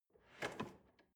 drawer large draw soft

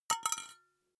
glass metal small drop

